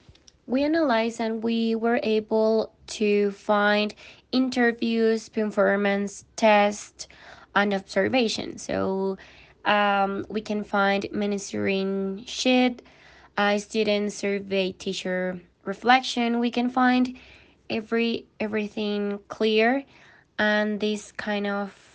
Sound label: INSTRUMENTS